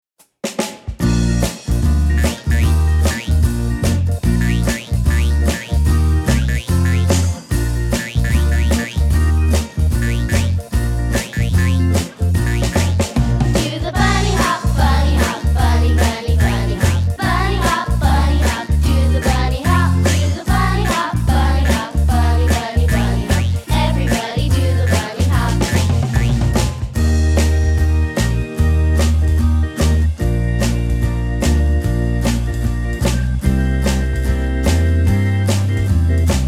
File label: Karaoke